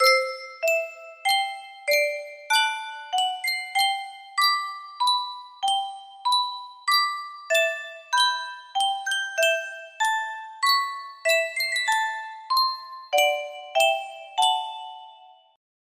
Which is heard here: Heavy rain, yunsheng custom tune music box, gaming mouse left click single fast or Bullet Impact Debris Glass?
yunsheng custom tune music box